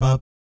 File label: BAP C3, vocal jazz; ILIO; Jazz & FX; JAZZCOMBO